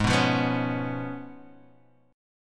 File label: C sharp 7th, G sharp